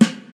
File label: funk snare drum sound a key, Royality free snare drum tuned to the A note.